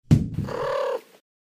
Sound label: thump cat